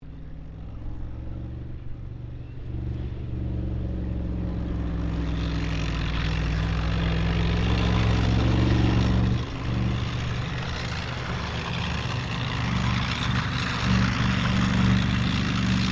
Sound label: TANK M4 SHERMAN MANOUVER FAST, American M4 Sherman Tank; Truck Sound Effects; Sound Effects:Vehicles